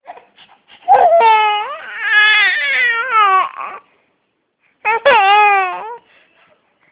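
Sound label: baby hungry, male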